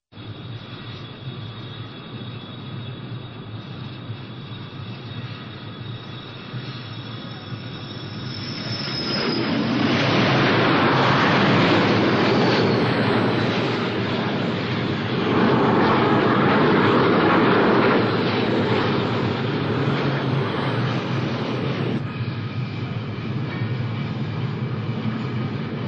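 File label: aircraft flyby, landing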